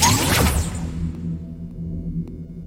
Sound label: transition enter; ExperimentalGear; transition screen